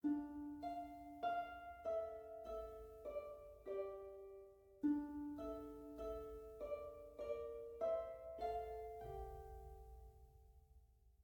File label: harp